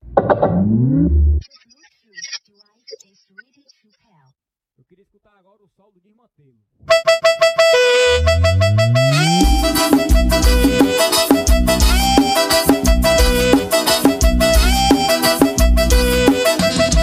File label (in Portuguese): musica